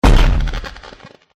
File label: ufo kill